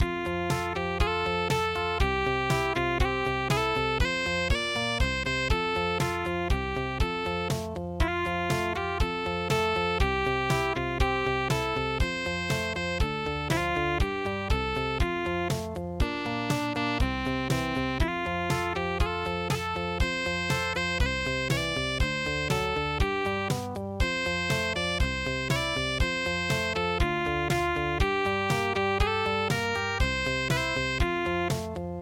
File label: soft rock; kakashi